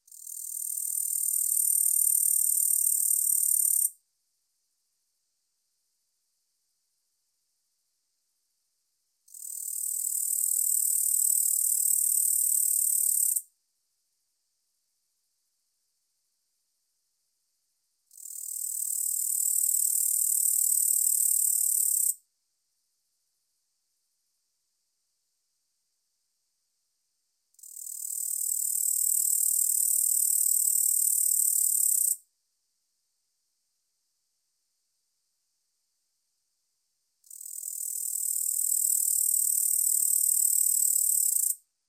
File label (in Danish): syngende løvgræshoppe; I varmt og solrigt vejr